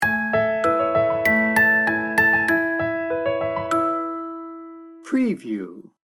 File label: pure magic sting bumper